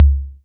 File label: 808 KICK